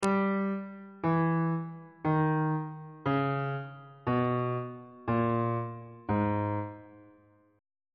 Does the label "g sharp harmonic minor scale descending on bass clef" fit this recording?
Yes